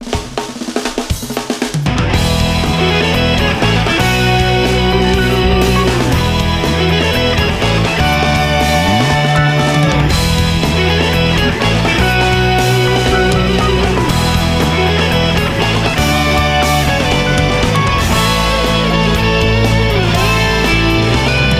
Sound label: license Bronze Loop; Gran Turismo 5; GT5